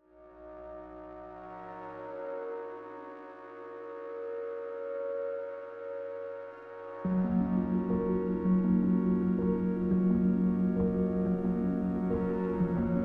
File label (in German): katze zimmer musik